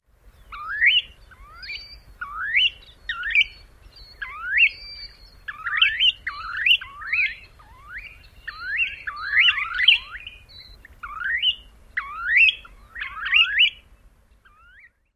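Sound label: snowy plover koorwee calls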